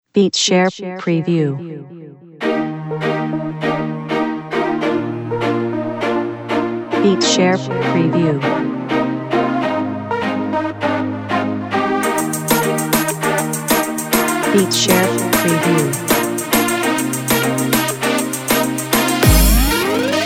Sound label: reggae bpm100